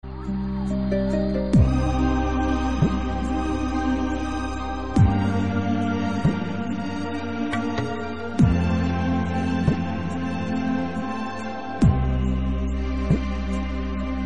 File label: Masuri Drama Flute Pakistani Ringtone